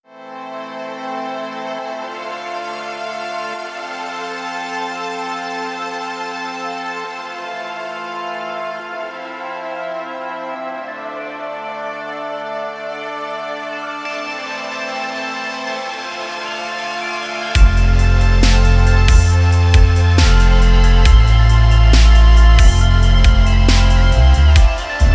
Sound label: downtempo version